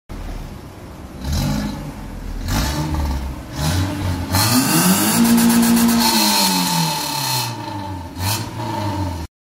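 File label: Turbo, exhaust; سورن; Suren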